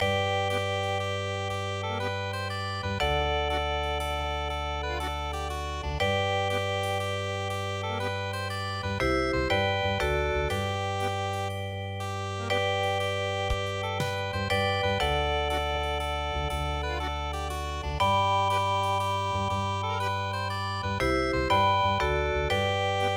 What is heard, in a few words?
Paris Musette